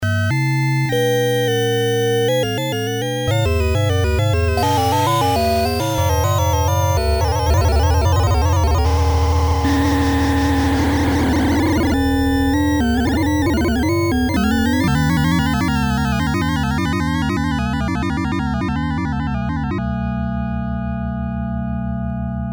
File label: dual noise, yamaha dx21; FX: noise DUAL MODE